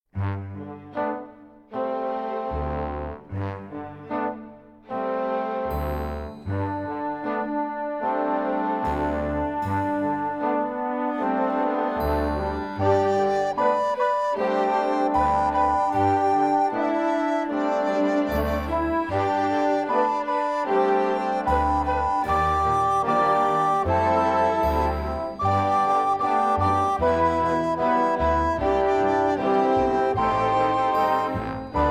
Appalachian Game Tune

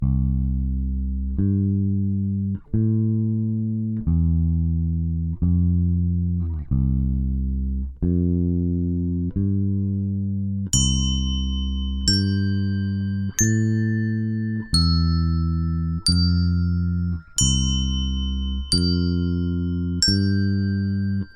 Canon in C

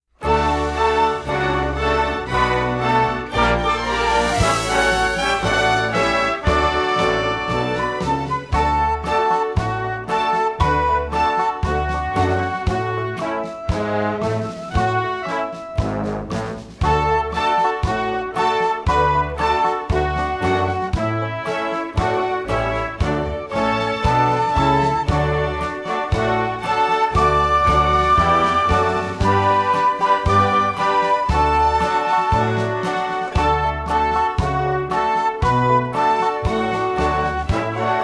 Camptown Races 116 BPM

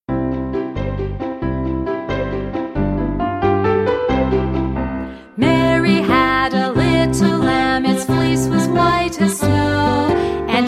Nursery Rhyme Song

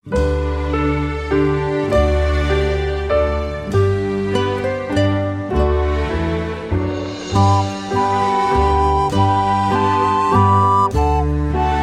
Little Waltz

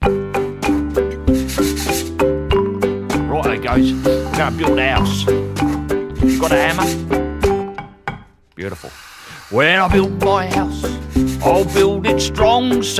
House Song Vocal

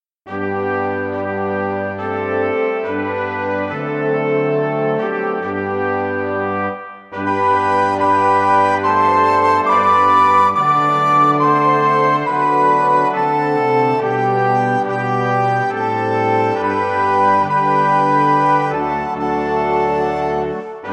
Ode to Joy

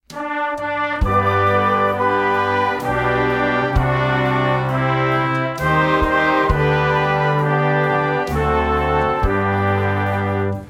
Happy Birthday 66 BPM

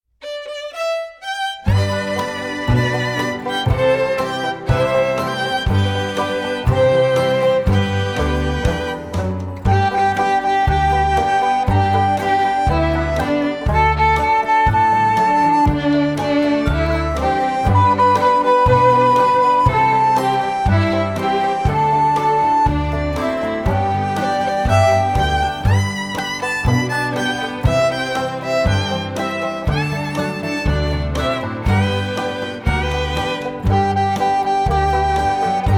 Old Brass Wagon FAST

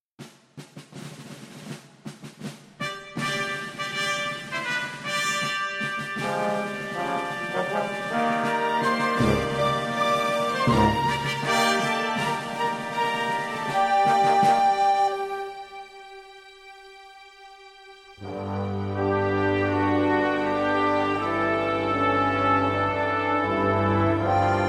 Civil War Ballad

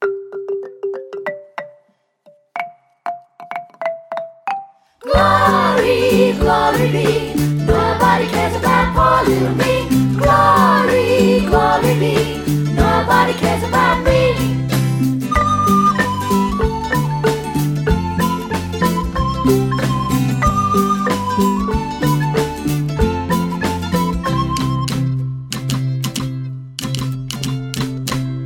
Glory Be Vocal

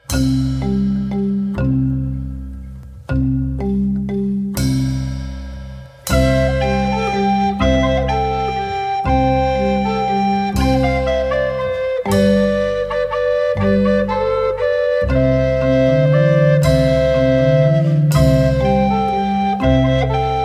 Waltz

